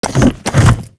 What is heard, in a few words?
Gear Shift Strong; 换挡3